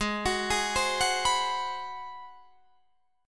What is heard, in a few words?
G sharp 7th Sharp 9th, Guitar, Open D